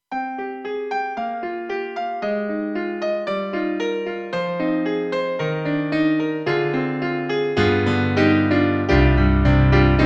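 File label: Big Pop Grand, piano; KORG EXB-PCM08; TRITON-Rack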